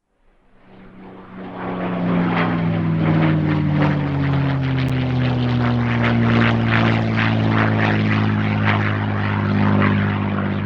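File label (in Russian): истребитель спитфайр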